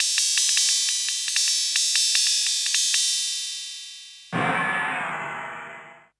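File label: COO percussion, drum loop; Big Fish Audio; Uncivilised G'rooves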